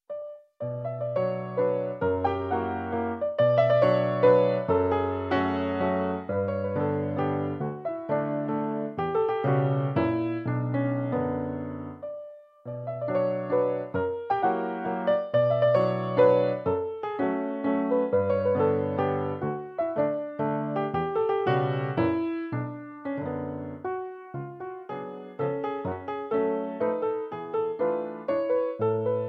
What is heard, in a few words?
MAZURKA C